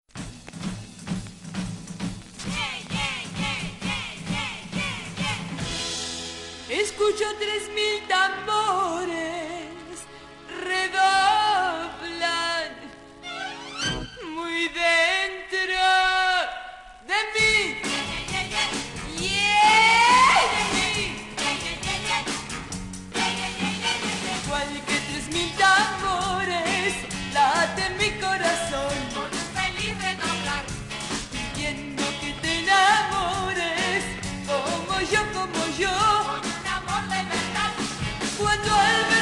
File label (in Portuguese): tres mil tambores 3000 tamburi